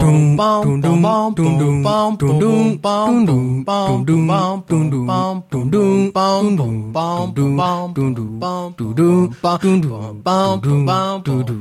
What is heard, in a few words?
Elevator music